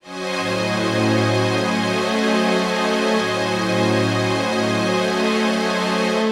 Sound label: Atmospheric Sting; Clue